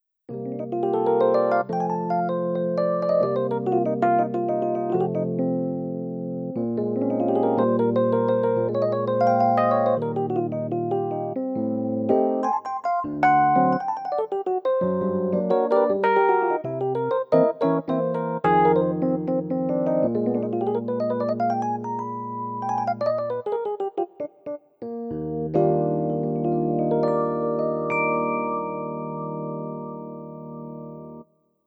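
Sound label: electric piano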